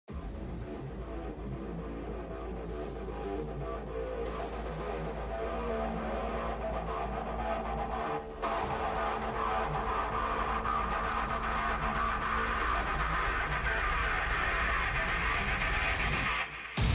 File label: Battle Forest